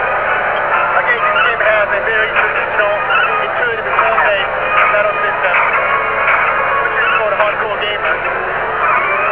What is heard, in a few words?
battle sounds; dq7